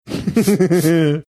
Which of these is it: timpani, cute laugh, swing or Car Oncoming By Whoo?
cute laugh